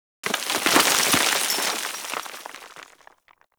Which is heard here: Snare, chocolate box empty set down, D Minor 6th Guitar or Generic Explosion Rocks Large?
Generic Explosion Rocks Large